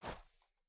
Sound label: step; snakeinabox